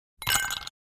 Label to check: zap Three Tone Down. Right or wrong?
Wrong